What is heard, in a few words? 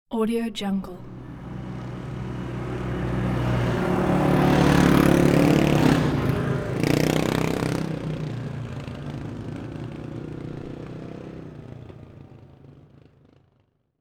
quads group pass by; urban sounds